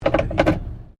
CAR HOLDEN FJ COLUMN GEAR SHIFT, Classic Australian cars; 1955; Cars (Sound Effects:Vehicles)